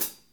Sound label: FOOT HATS, Drum Kits